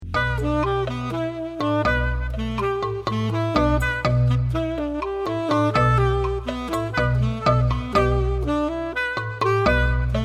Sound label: balafon